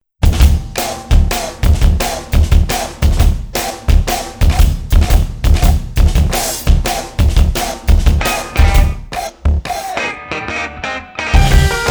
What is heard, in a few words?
snare; hanger